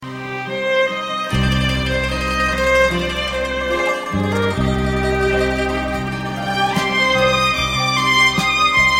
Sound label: Romantic Violin